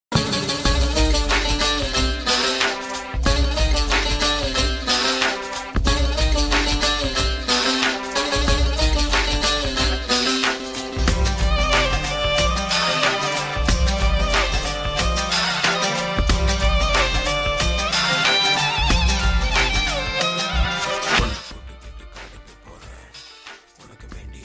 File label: bgm ringtone, tamil bgm ringtone; pudhupettai; dhanush